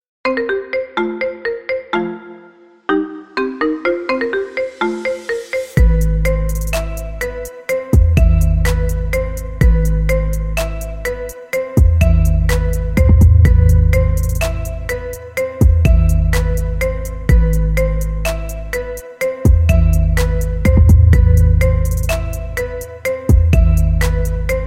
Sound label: Marimba